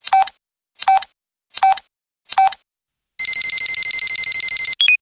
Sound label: javelin lock